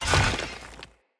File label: Ground Pull, Swords; Sub Boss; Weapons; Enemy; Mockery Swords; Stultion